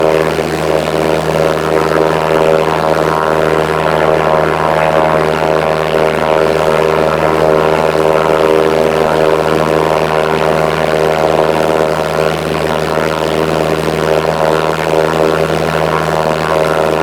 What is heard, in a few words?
propeller; planes; gmod; lvs planes